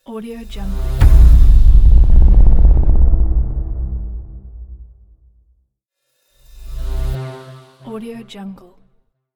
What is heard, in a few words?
cinematic intro logo